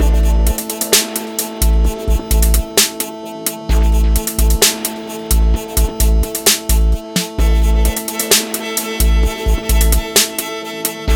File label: background music